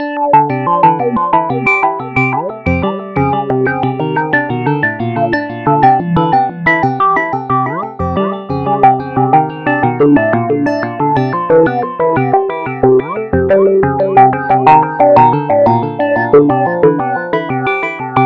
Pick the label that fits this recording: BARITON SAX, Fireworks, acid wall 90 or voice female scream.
acid wall 90